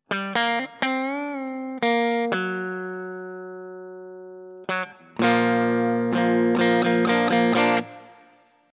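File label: bridge neck, guitar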